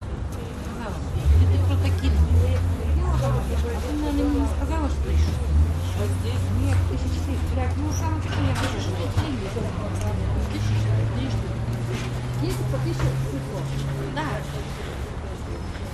moscow russia crowd winter medium traffic open air food market heavy walla crispy; snow